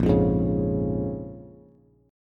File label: Bb-Minor 7th-Bb-down-Bass; Bbm7 strummed